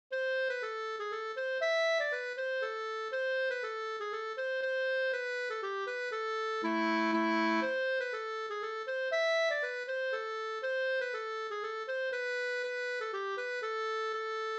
polska Slangpolska

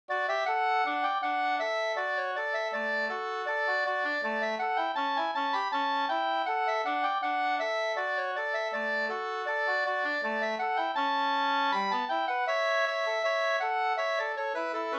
skanklat brudskalslat

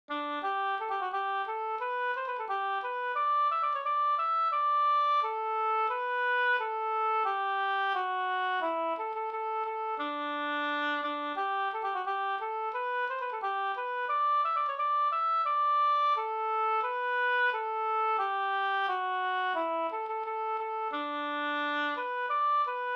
ganglat; Bevaringsmarsch

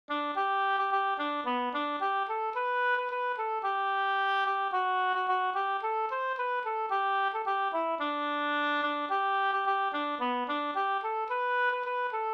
ganglat

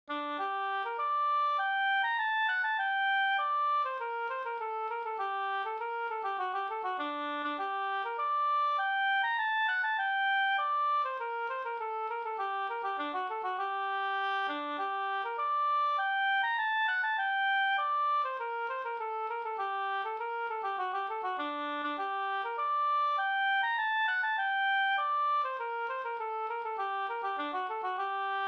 polska Systerpolska

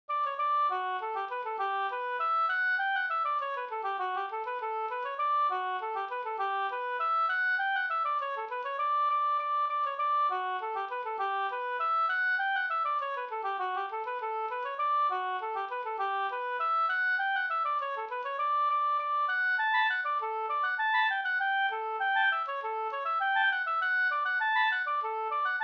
engelska

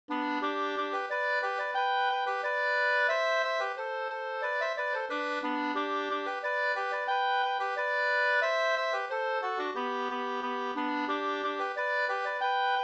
engelska; Hagerbo

